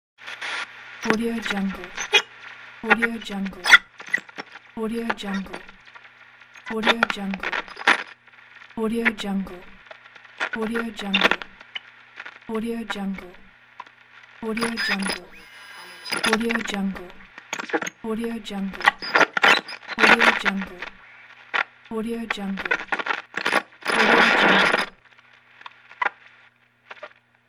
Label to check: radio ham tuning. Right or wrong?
Right